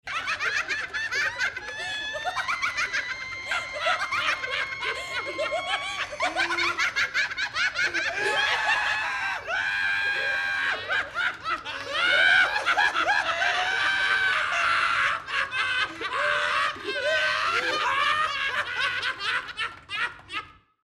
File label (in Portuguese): Risadas Individuais